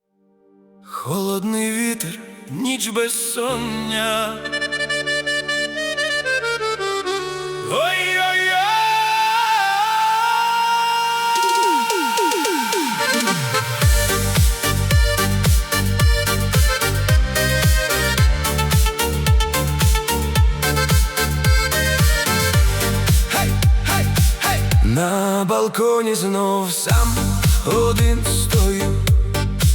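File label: Ukrainian music for the car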